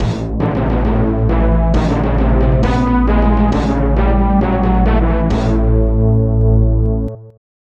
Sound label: New Battle Field; King of the Monsters 2; SNES